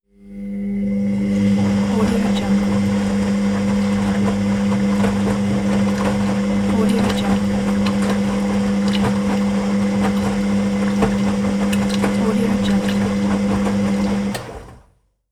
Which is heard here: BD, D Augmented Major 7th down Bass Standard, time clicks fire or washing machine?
washing machine